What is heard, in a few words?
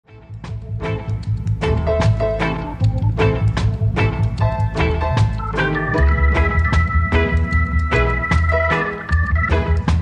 Ghetto Organ